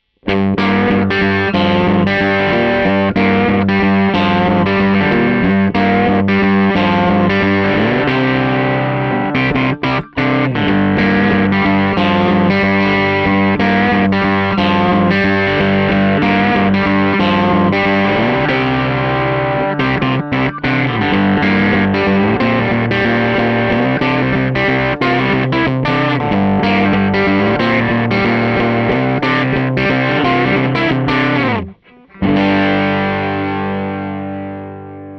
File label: riff; machinemodeling; TONEX